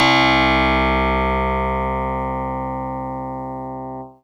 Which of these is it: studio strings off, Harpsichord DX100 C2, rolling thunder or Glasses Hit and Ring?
Harpsichord DX100 C2